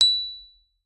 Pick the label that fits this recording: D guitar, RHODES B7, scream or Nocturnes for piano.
RHODES B7